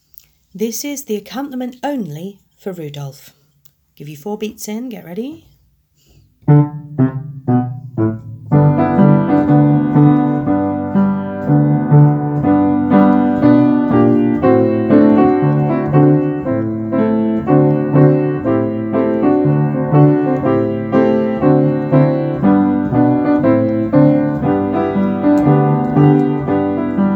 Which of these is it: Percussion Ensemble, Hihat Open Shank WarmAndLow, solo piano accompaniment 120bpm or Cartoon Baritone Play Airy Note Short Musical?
solo piano accompaniment 120bpm